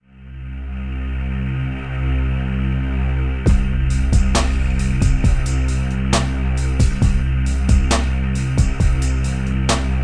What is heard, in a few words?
low perc, ambient